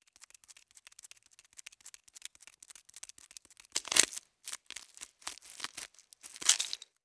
Spider Feet Scurrying; Fairlight Sound Lib

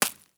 Arrow Impact, Game Weapons; Military; Game Essentials

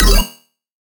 Bass Cile, Dubstep; Bass One Shots; Ghosthack; Dubstep Sound Arsenal; 50 Bass One Shots

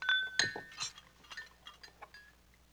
Pickup Dishes Tablecloth; Vintage Cartoon; Foley

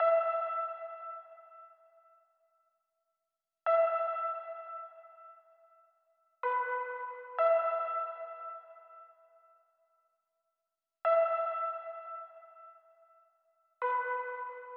Reverse Melody Loop 130 BPM E Min Piano; Loop Stems; Cymatics; Oracle Sample Pack

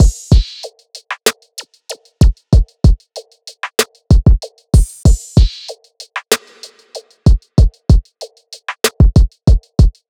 Drum Loop 95 BPM; Ultra; Cymatics; Vibes II Drum Loop Collection

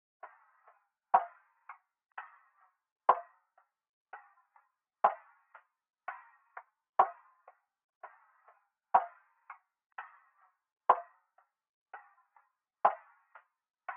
123 BPM G Min Perc, loop stems; Melody; God Fearing

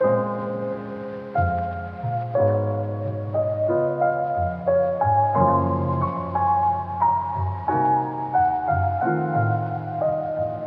Piano Dry 90 BPM A# Min; Melodics; Cymatics - Eternity Sample Pack

